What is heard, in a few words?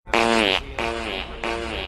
echo fart